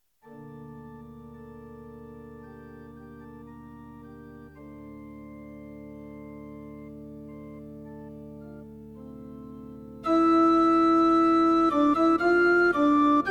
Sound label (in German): Sopran Alt